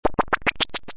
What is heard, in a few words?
Stomper build; Robot Wants Fishy